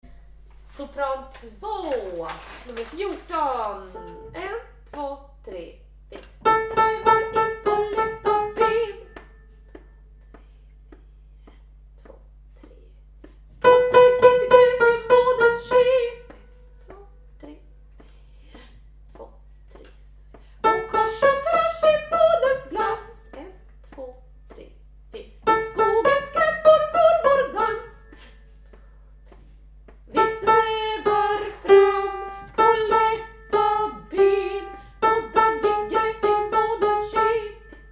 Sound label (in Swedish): Muntra sopran